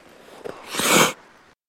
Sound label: Hockey Skating Skat, ice skating